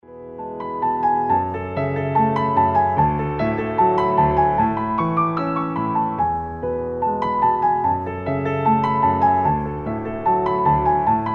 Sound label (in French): piano extrait